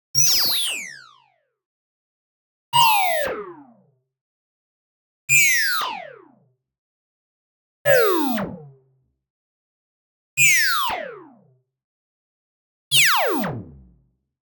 tonal, oscillator and noise descending; boom library; Anime Essentials

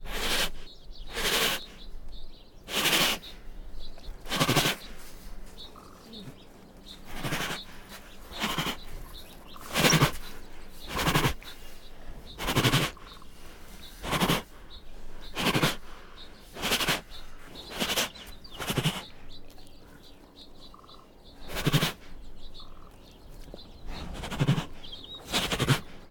Male Bengal Tiger Breathes and Snorts; Tigers; Sound Ideas

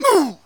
Male Hit By Pitch Baseball

